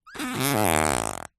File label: cartoon fart